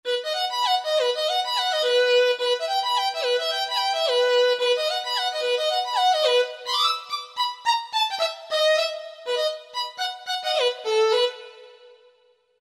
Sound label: First Fiddle, Roland V-Synth; Factory Patches